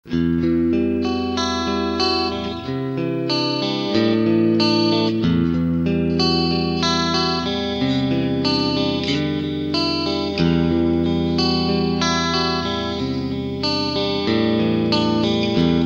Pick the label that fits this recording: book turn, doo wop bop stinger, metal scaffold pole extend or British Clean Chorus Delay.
British Clean Chorus Delay